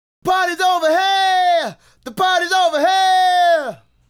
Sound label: Male Solo, Hip-Hop and RnB Vocals